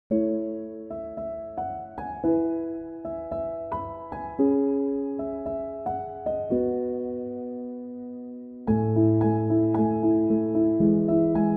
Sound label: piano instrumental